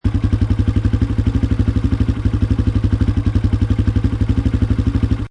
Motorcycle Dirt Bike Idle Loop, Motorcycle motor loop; Motorbike type offroad Dirt Bike, motocross. Seamlessly l; Lynne Music